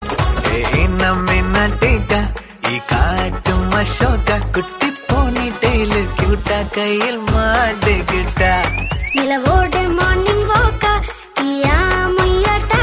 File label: tamil movie songs